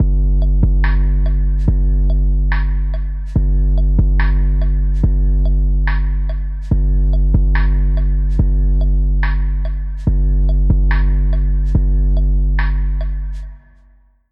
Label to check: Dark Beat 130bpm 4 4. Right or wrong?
Right